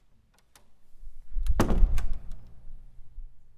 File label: Closing Door